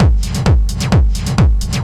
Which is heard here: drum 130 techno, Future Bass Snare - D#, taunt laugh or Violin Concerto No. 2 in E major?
drum 130 techno